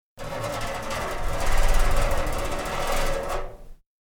Metal Grinding Short, Scraping metal, metal under pressure being bent; or exposed to force or heat